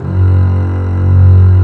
ARCO BASSE; E-MU Formula 4000 Series Vol. 5; Protozoa; Proteus2 Instrmt

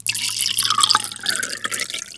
eau, BAR AND PUB; East West; Global Sounds

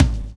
LA CLOCHE; Univers Sons

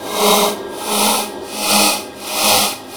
PONCEUSE; USB Soundscan vol.33; AKAI; Disjointed Textures; TECHNICS

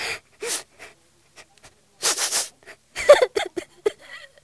PLEURS; East West; Global Sounds

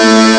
guitare; Amiga Soundtracker Sample Packs

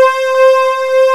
VIOLONS, PPG; USB Soundscan vol.49; Analog Fantasies; AKAI

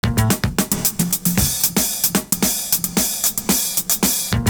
LE BATTEUR, LOOP; Univers Sons

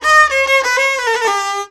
VIOLON, Arabian Traditions; USB Soundscan vol.43; AKAI

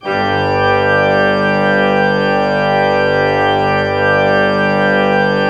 Orgue Eglise Full G3, Pipe Organ Full

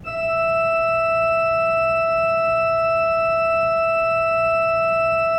Orgue Eglise Doux E6, Pipe Organ Soft

